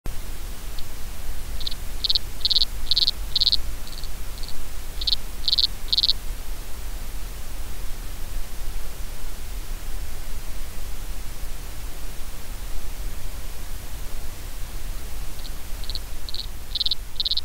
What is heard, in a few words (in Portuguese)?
barqueiro de agua; pequeno animal alcança 99,2 decibéis usando o pênis como instrumento